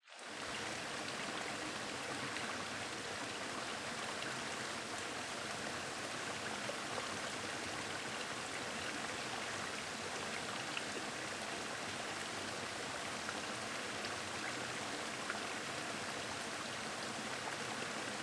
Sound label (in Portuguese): Ambiencia mais distante de Corredeira com Queda Grande ao Fundo; Corredeira do Raizama; Cerrado